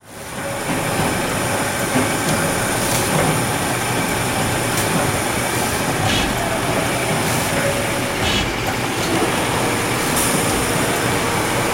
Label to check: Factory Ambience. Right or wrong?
Right